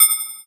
multimedia rollover; sound effects one

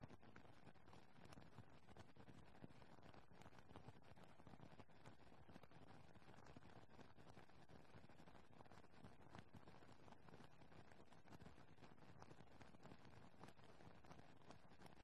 household kitchen cooking porridge boil pan

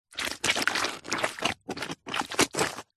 wood stick movement sludge wet mud squelch; foley

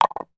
household aerosol can lid down on wood surface; zapsplat

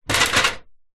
industrial step ladder metal set down on side

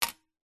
industrial screw metal set down on metal surface; zapsplat

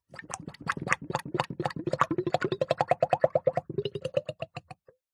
cartoon slime bubble up; zapsplat

